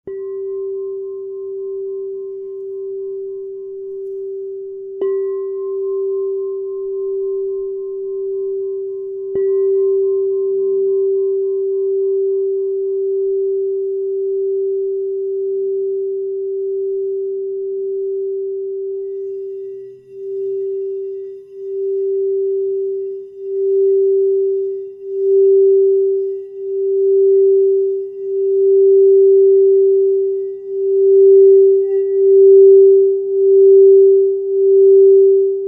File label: Crystal Tones Singing Bowls